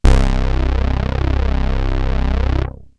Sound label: ANALOG, BASS; Best Service; Hyper Dance